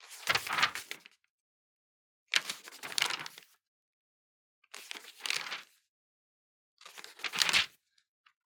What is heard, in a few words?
Organic Paper Page Turn; boom library; The Interface